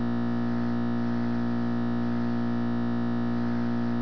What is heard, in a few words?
Twn electr